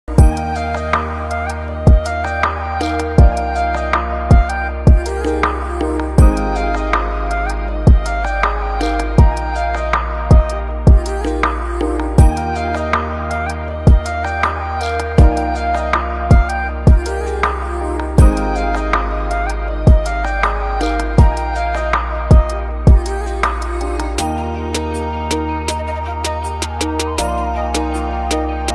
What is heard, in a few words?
tune ringtone